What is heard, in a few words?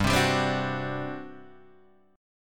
G Major 7th Suspended 4th, G; GM7sus4 Chord {3 3 5 5 3 2} strummed